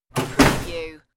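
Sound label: Open Broiler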